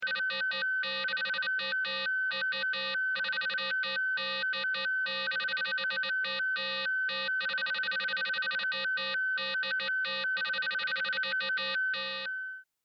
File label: SciFi Scanner, Sci-Fi Scanner Sound Effect; Communication; Perfect for general Science Fiction usage or Multimedia usage; Communication Sound Effects; CraftedSignals